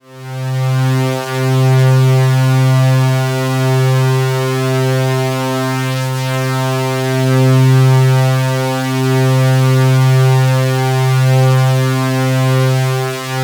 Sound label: Shepard PW high; experimental